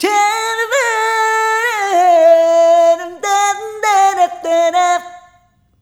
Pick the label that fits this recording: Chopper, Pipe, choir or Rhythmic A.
Rhythmic A